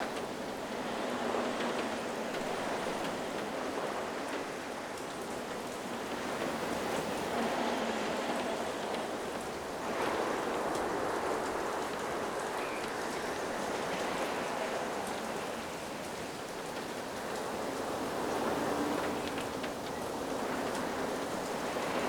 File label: SNOW HIGH OUT; SIMUZENSOUND